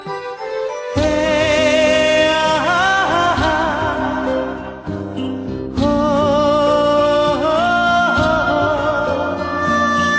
Music

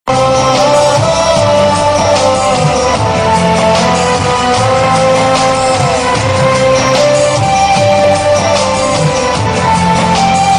Instrument; Pratigya

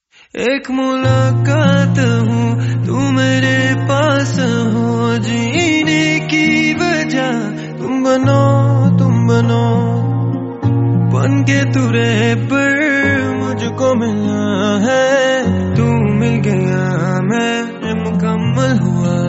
Bollywood Medley

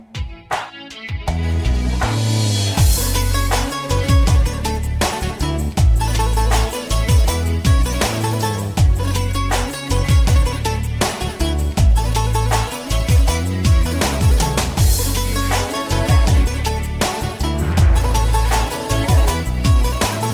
Romantic Guitar

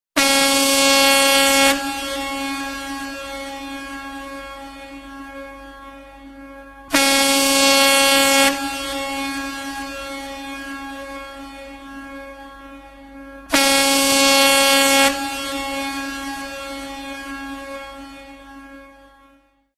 Locomotive Sound

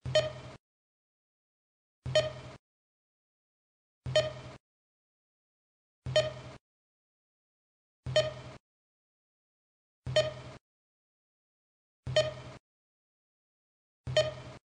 Beep; Lost

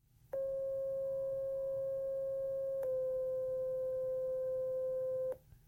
Fire Tone

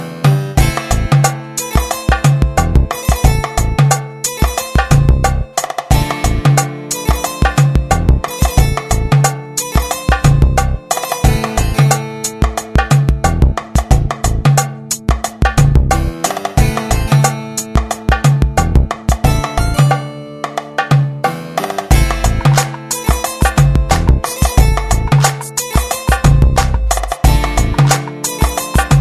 Best Arabic Ringtone

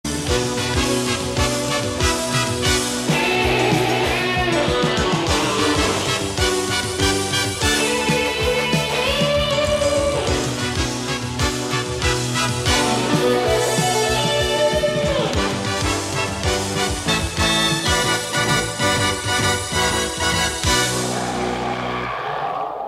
Ending; Thundercats

